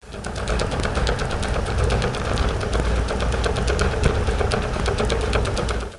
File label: Tanker; cetsound